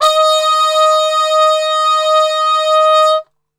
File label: D#3, Brass, TRP CUP MUTE; Best Service; Brass Super Section; 90 s Sample CDs